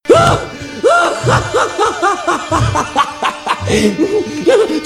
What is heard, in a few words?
laugh